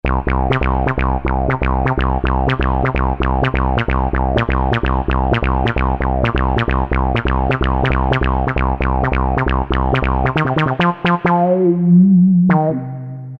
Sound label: Acid Bass, Yamaha QY70; XG Voice examples